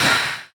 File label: phantom hurt; 幻翼（我的世界）; 幻翼：受伤; 幻翼在受伤时随机播放这些音效